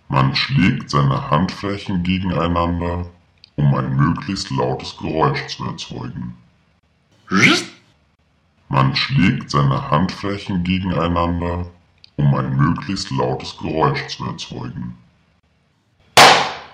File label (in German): Man Klatscht